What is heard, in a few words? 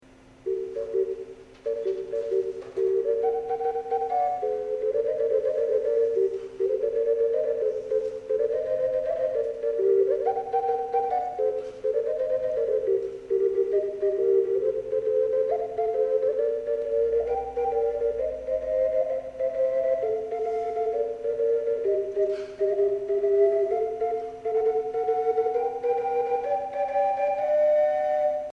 ocarina; oca a terze gemelle(min)